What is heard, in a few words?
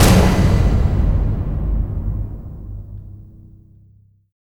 Fail